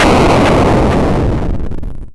explosion long

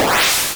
explosion short soft

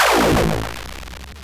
sounds negative

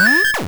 interaction

